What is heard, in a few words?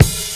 Dance Drums kit; Best Service ProSamples vol.15; AKAI